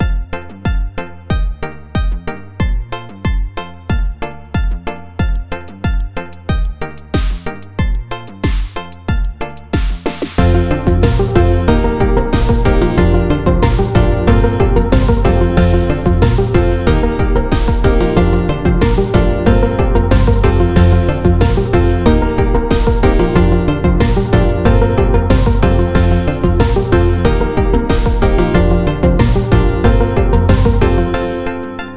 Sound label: Balls (Instrumental); friday night funkin vs. umbal family computer